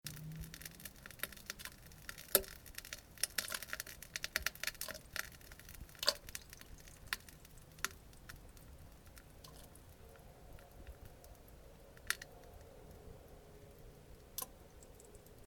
普通うんこ むちむち ちゃ むちむち音

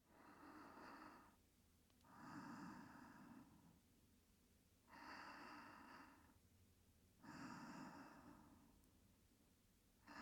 寝息左 （ノイズ除去なし）; 撫猫さんと添い寝; 左選択

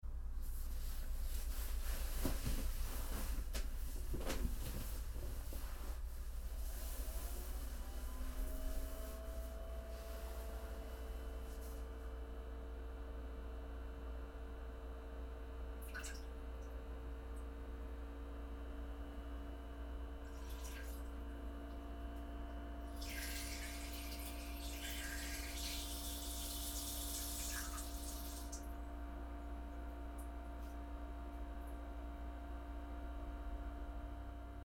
脱ぐ、座る、おしっこ音（声なし）

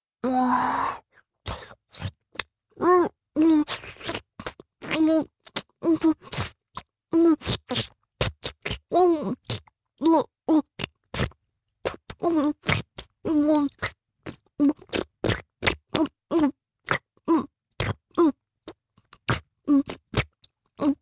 フェラチオ(強):頭を前後に振って陰茎をしゃぶる; 実用ボイス抜粋集

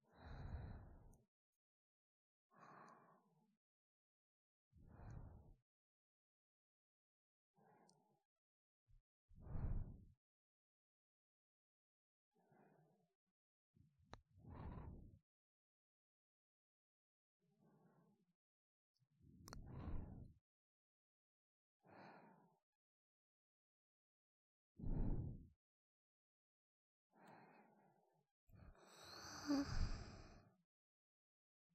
寝息オンリー; ミカとおやすみなさい; 効果音なし

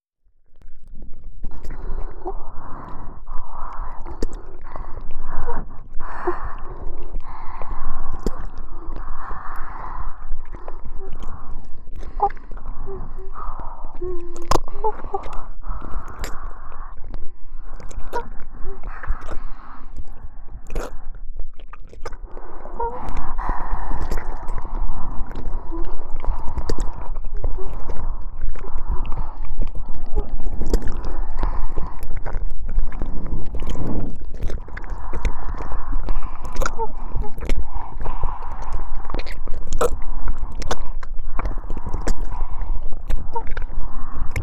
深い耳舐め（反転）

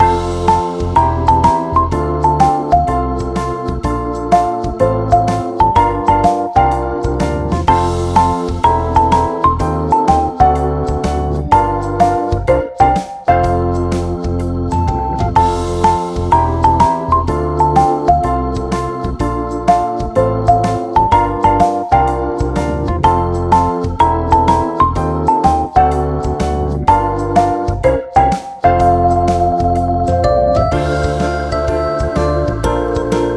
マリンバ ver.【劇中BGM】

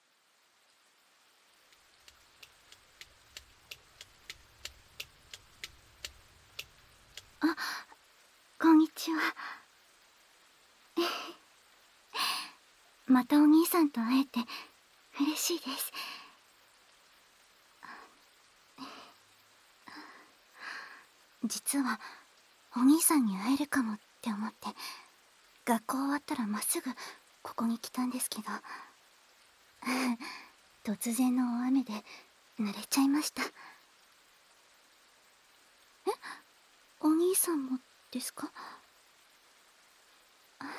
キス・ディープキス・おっぱい揉み・フェラ, ASMR

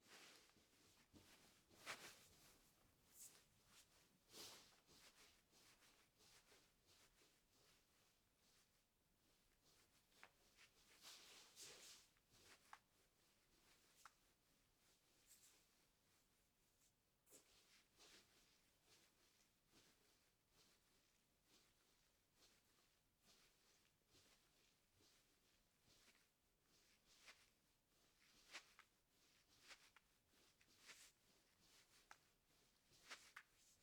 騎乗位中の挙動(上下にゆっくり); アダルト系

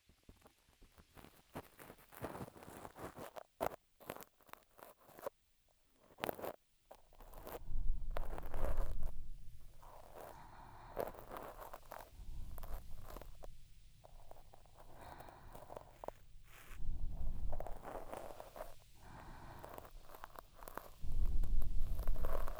英語版後半 左耳耳かき, ASMR

